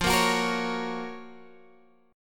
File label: Gb Major F; Listen to Gb/F strummed